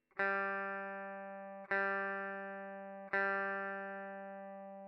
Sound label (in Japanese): 3弦の「G」